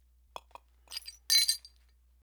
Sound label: tap mug on ground